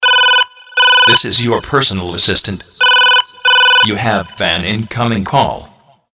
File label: incoming call